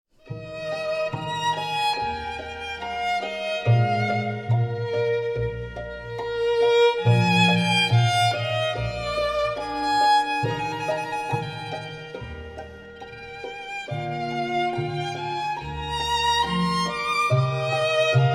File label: Violin Concerto in F Minor, Largo; The Four Seasons; RV 297, Op.8 No.4; Winter